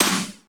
snare g key; snare drum samples; drum single hits one shots

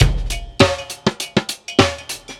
134 bpm drum groove f key; Duration 0:02; Samplerate 44100 Hz; Bitdepth 24

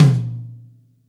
verby tom drum sample B key

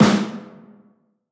deep steel snare drum g sharp key; sample

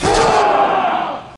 ASTONISHED SHOUT

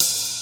clean open high hat f sharp key; Duration 0:01; Samplerate 44100 Hz

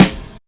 bassy acoustic snare sound f sharp key

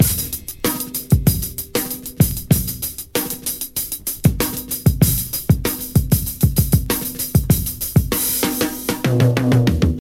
96 bpm drum loop f key

